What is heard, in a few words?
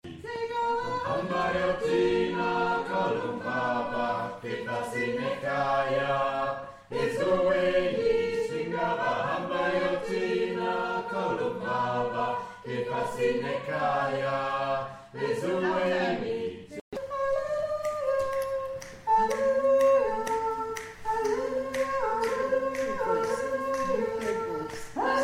tenor